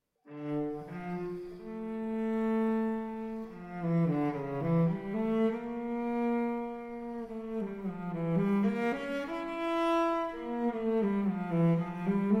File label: Cello Suite in D Minor, Prélude; Cello Suite No. 2; BWV 1008 I.